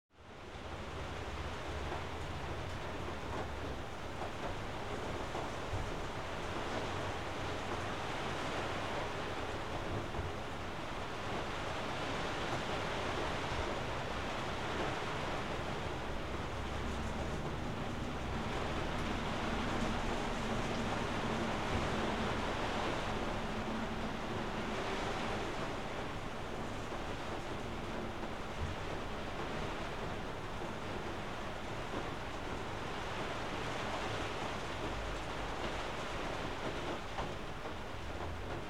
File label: Attic Rain